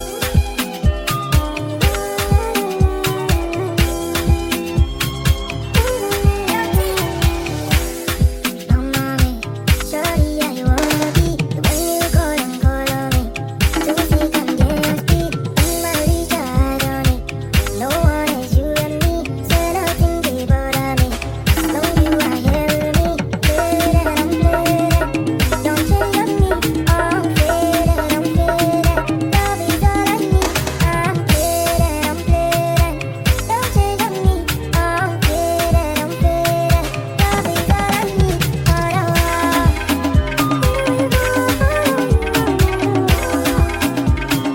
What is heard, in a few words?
Unfaded Drum Dance